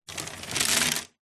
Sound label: blinds venetian rub slats with hand; household